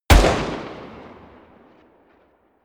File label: revolver distant shot; schusswaffen